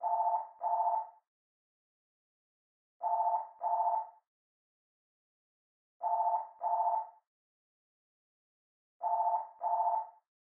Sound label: Telephone Ringtone